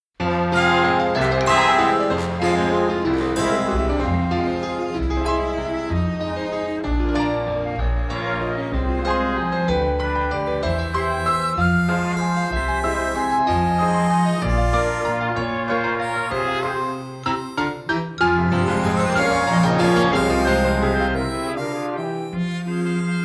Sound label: winds do change accomp